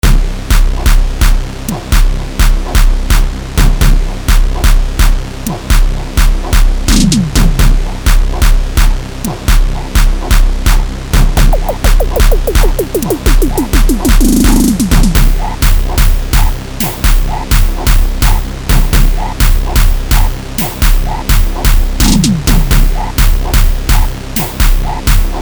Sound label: Gqom Mix